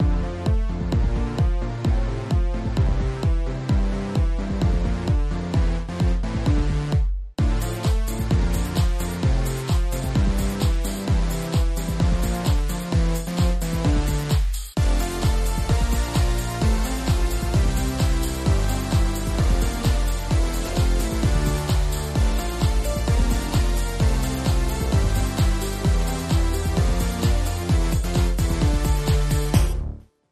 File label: 130bpm Alt Percussion